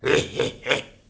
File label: piglette oink